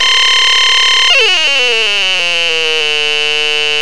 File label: dragon kill